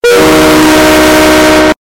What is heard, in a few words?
horn EMD F7A loud; trainz; atsf